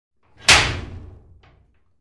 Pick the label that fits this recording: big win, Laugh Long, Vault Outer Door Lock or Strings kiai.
Vault Outer Door Lock